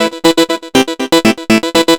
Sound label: Progressive Synth, Trance